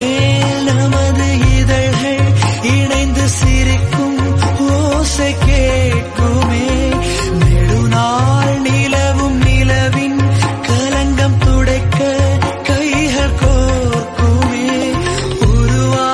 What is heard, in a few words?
Tamil Song